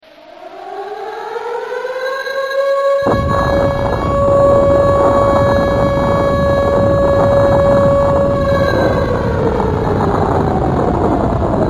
Bombenalarm

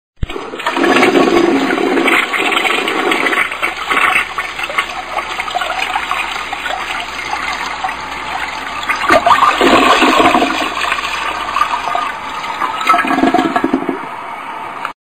Toilettenspuelung; Haushalt

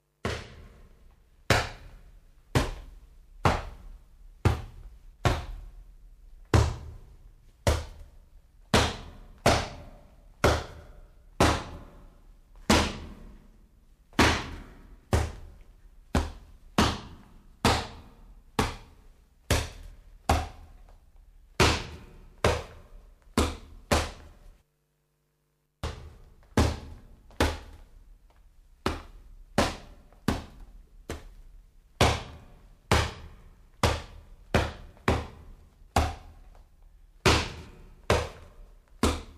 Punchingball; Sport

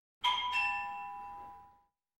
Tuerklingeln; Haushalt

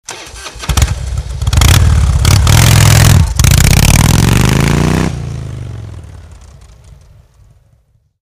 Motorrad schnell abfahrend

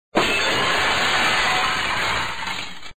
Geklirre; Haushalt

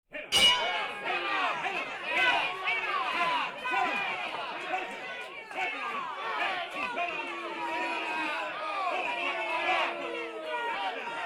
Boxatmo; Sport

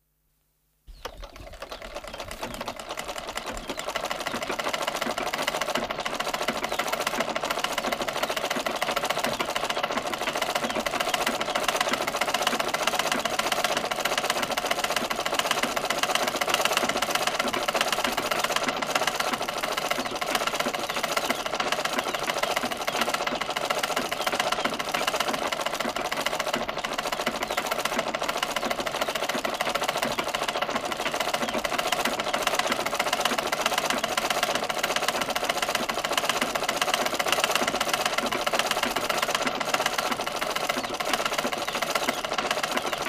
Handnaehmaschine; Industrie

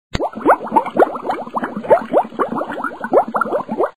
Blubbern; Natur

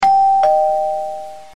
Hausklingel; Haushalt

